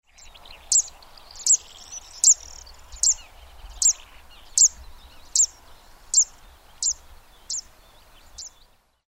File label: zitting cisticola